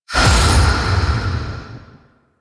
Void Tower Capture Disrupted; Warframe

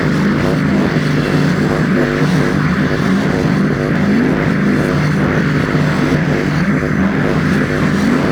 Race Gate Loop; Warframe; Tau; Circles Of Hell

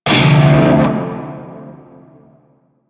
Shawzin Min Chord D; Warframe; Narmer Shawzin

